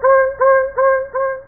Horn; Realmz; Assault on Giant Mountain

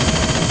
dry spin; BigMax nº 14 Shattered Steel

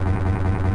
Elec arc; Big bang

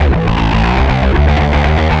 bass; GrooveMaker demo; Serious Software; Macworld 1999-04

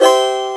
F Major, Guitar; The Guitar Tutor; Educational

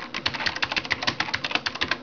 KEYBOARD; Master Clips Email Animator

